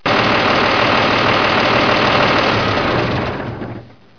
suara mobil

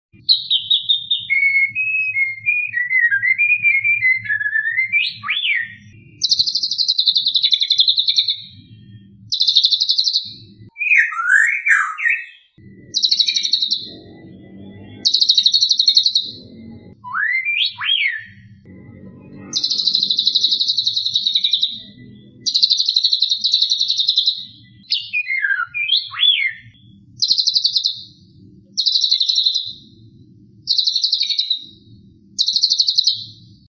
murai batu isian cililin

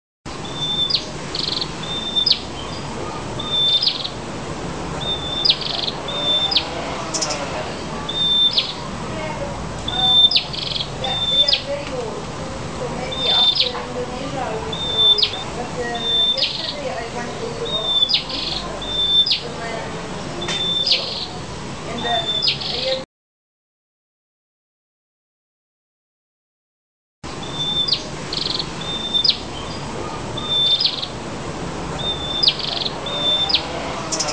2 ekor prenjak kepala merah

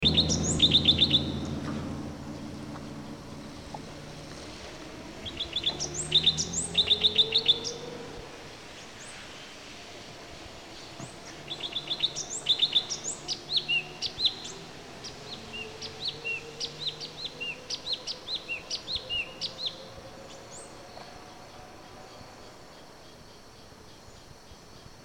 suara burung cucak cungkok orange bellied leafbird

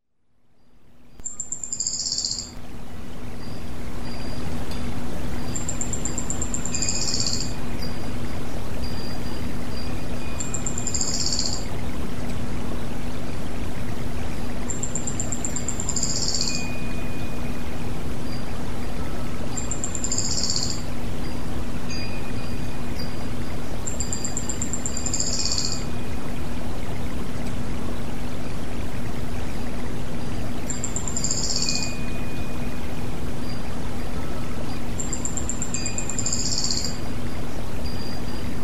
terapi ak betina

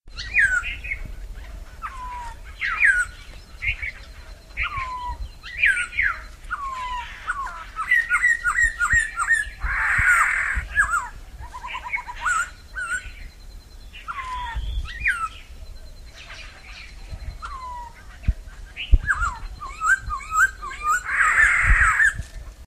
suara poksay punggung merah

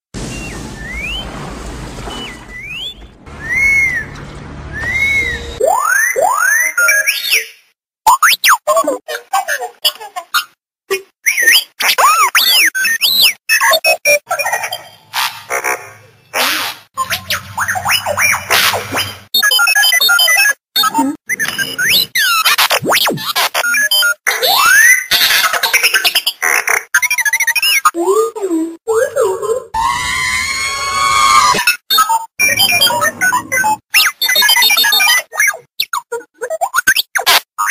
suara robot untuk masteran

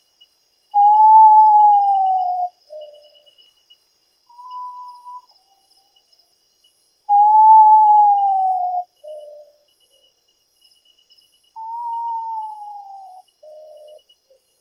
suara common potoo

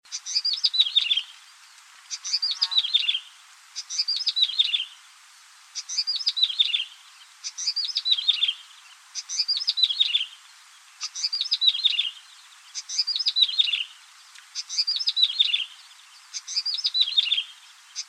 suara prenjak rawa

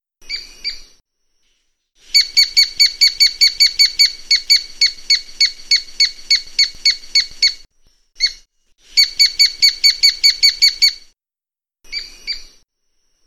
tukik tikus call

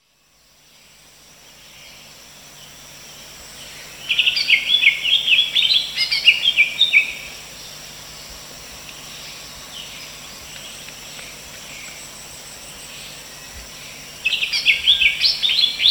suara cucak hijau betina

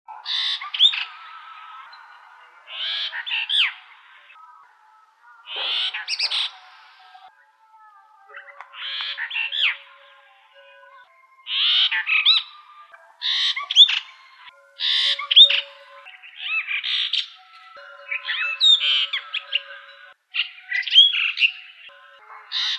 jalak brahmana

